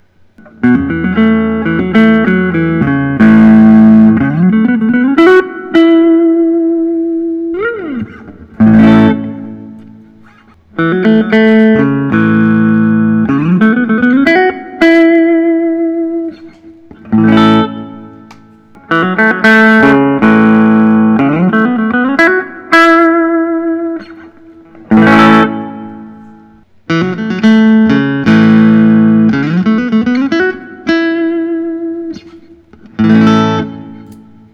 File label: paul reed smith hollowbody ii